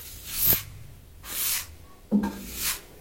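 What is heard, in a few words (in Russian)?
грубая беседа с серым камнем, метлы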